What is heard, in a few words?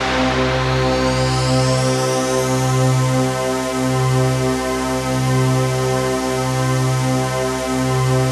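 chord pad, Pads; ATMO PADS; Best Service; XX Large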